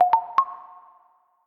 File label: email notification; Librem 5